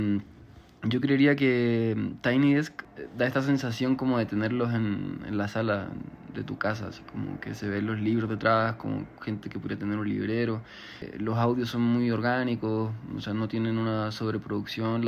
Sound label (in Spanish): Cuña Música